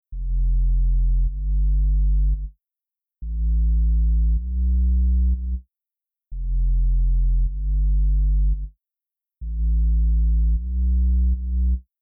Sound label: FutureBass Bass Sub